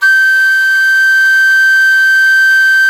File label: F#6, REED FLUTE; SoundBytes - Early Patches